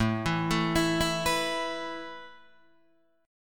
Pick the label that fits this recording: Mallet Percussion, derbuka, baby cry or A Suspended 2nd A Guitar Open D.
A Suspended 2nd A Guitar Open D